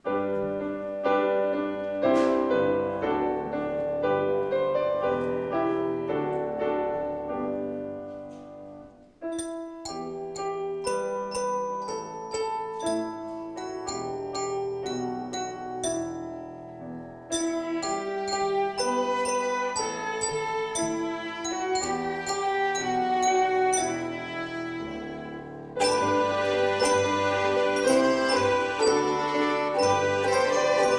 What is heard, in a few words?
Instrumental